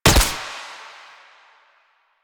Pistol Shot; Weapons; DE; Warframe; Tenno; Lasrian

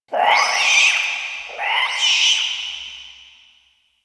interior screechy call; Ambience; Misc Indoor; DE; Warframe; Infested Eris

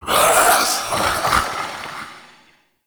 Male Vocal Attack; Characters; Enemy; Vocals; Mockeries

